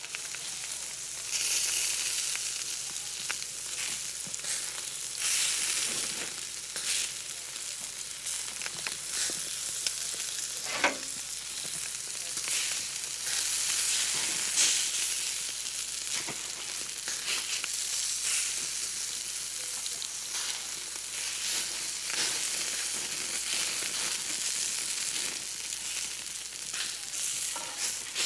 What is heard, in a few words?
barbecue; rc3 2021 map